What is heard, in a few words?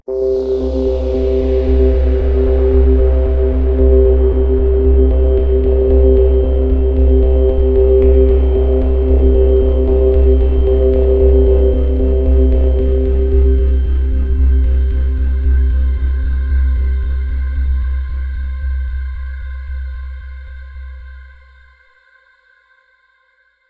Music Loop